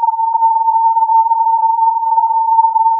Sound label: filtered input